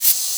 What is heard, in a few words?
SHAKAN, PERC; NorthStar - The Wizard VOL-2; TSAR PERC